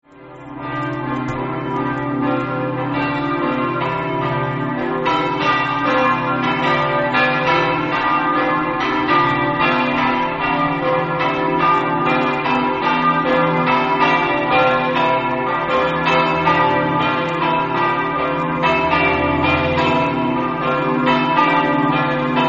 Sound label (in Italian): campane; prema